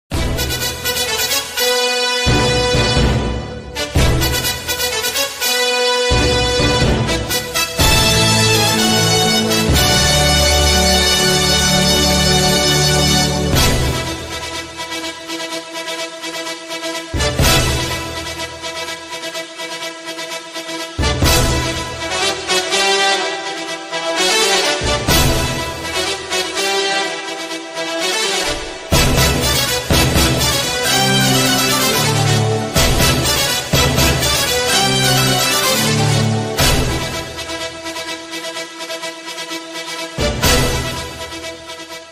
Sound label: muthu bgm ringtone tamil bgm